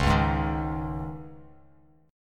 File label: Db Suspended 2nd Bass Standard; strummed; Dbsus2; JGuitar